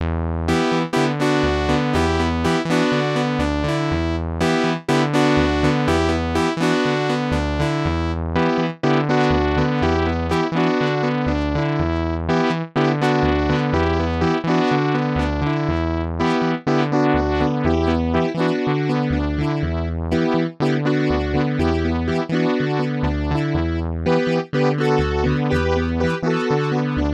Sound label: Synth Phrase